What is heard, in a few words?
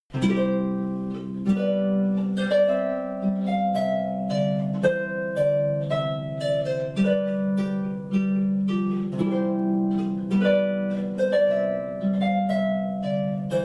Trumpet Voluntary, Harp